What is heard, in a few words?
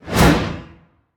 angelic basic swing; smite; thanatos